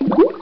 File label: GLUG